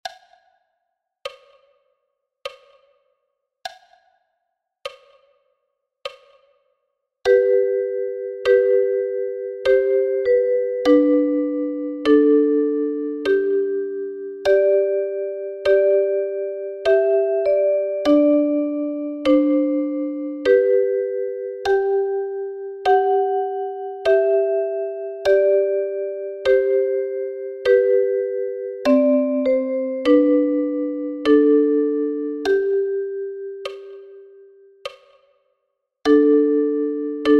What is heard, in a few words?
Ukulele; Nr 1 aus drei kleine stuecke zu vier haenden; anton bruckner 10 bearbeitungen fuer ukulele